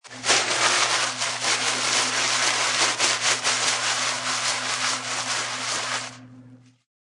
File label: Wiping hands with paper towel.; Paper Towel Rustle; Classroom; Schools